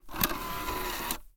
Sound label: disk takeout